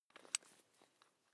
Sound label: electric drill bit fasten wrench turn slow